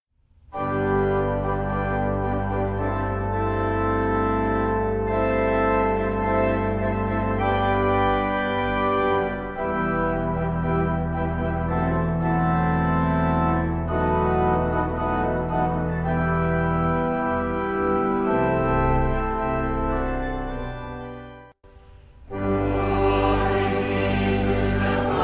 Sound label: Hymn